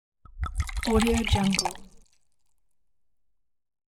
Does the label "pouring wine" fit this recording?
Yes